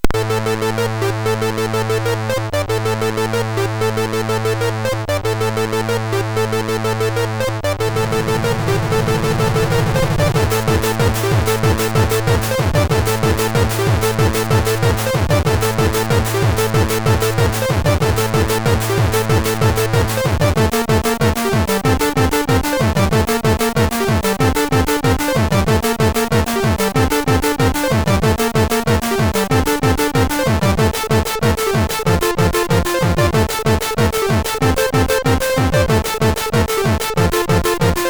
Techno, sid MOS6581R3; Techno in the Dark

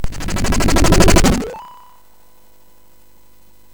sfx, MOS 6581; MOS6581R4; hvsc

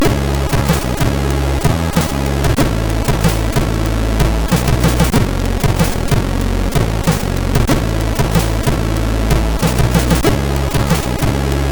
Bass, sid MOS6581R3

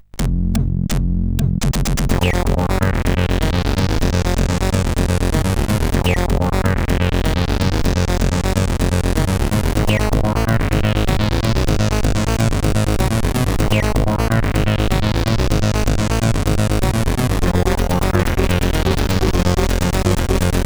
Techno sid CSG8580

